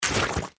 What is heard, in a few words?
obstacle water